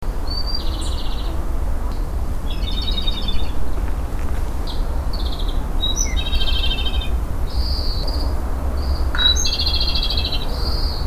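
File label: Japanese robin; komadori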